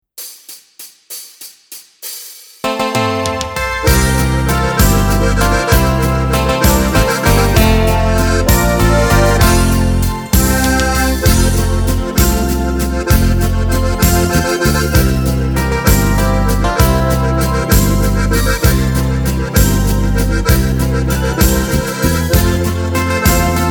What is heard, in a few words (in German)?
ohne Harmonika Lead ohne Chor